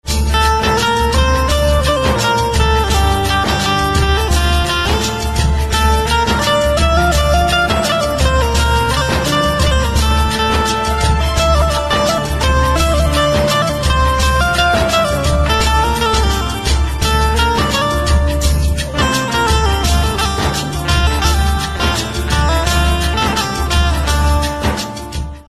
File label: Banjo Instrumental Ringtone